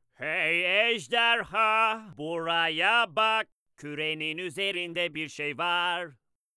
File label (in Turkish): Şarkı